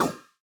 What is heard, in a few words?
ball bounce cold; CrossCode; battle